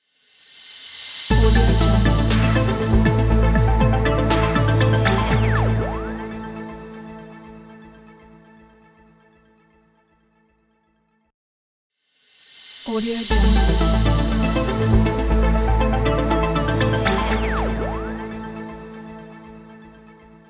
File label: logo intro opener